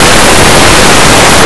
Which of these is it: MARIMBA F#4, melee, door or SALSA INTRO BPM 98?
door